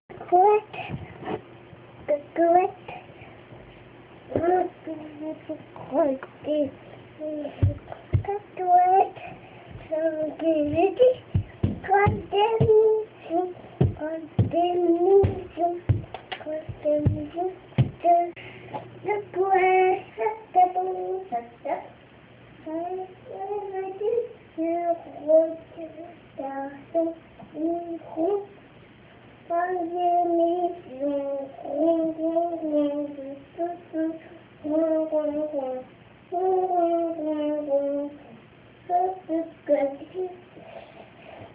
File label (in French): Chanson; Pirouette Cacahuette